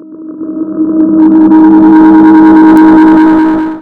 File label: Scare, Horror